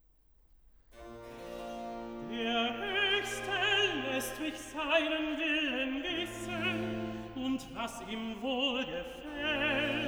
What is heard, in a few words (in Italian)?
Cantata, Recitativo; BWV 45 'Es ist dir gesagt, Mensch, was gut ist'; Bach; Rendsburg; 8th Sun After Trinity